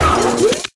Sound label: PEACE DAMAGE